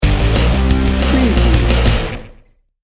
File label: head basher sting bumper